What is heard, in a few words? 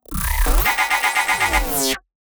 transitions sci fi movement; SoundBits